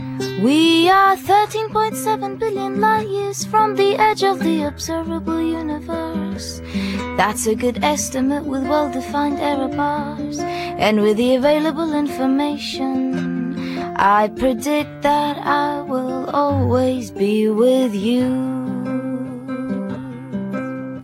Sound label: bicycle song